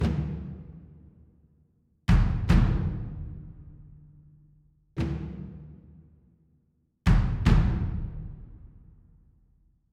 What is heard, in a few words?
Timbani stabs; gamerip